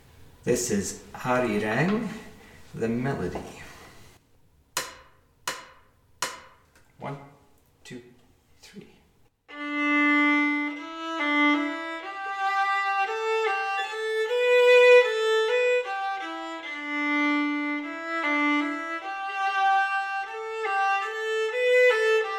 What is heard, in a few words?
Arirang melody